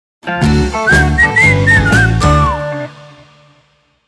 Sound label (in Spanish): AUDIOLOGO FULL; ALTOQUE